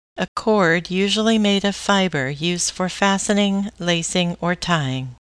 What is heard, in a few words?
string